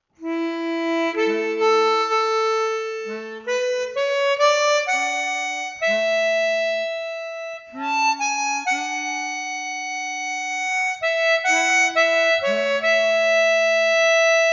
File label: Shenandoah, harmonica